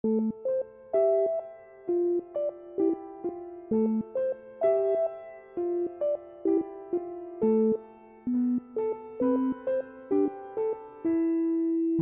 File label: Sync Sine Soni